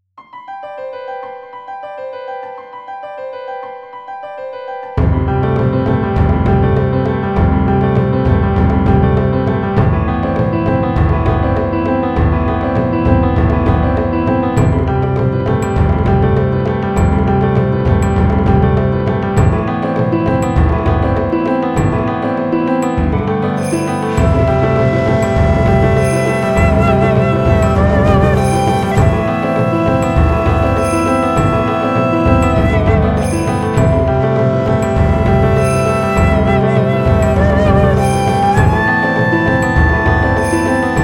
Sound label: Instrumental; Toho Complete Box; Touhou Oni